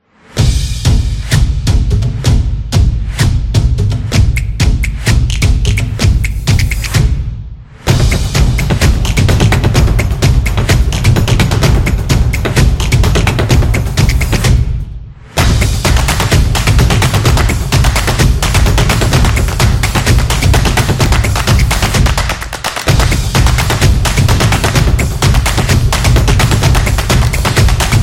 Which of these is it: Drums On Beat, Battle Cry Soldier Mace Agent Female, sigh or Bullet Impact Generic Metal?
Drums On Beat